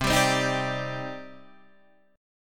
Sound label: C Minor Add 9th C; strummed; madd9:1,b3,(5),9; JGuitar